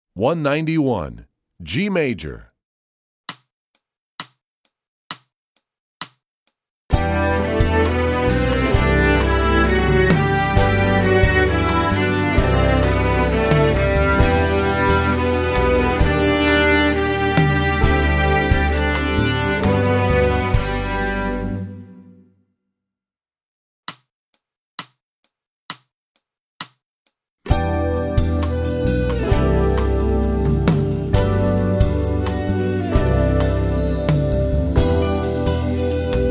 G Major, Strings; EE2000 Book2

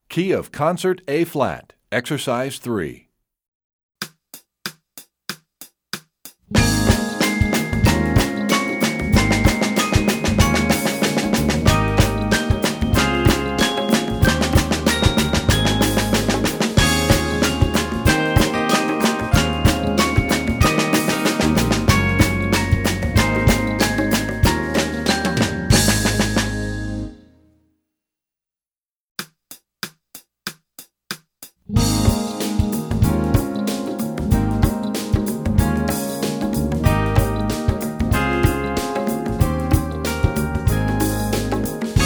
Concert A flat, Percussion; EE2000 Book1

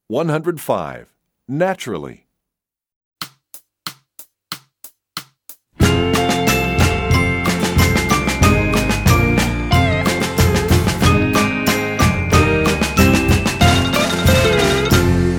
Naturally, Percussion; EE2000 Book1

